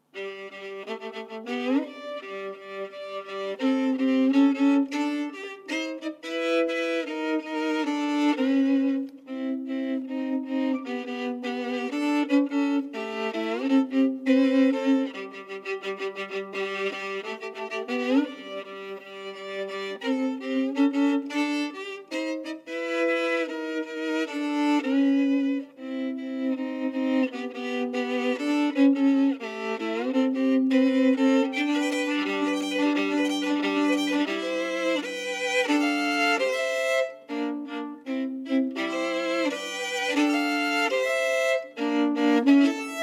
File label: solo violin